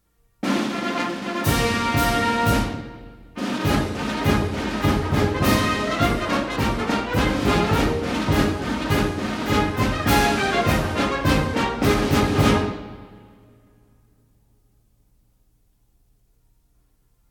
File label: one ruffle and flourish into flag officers march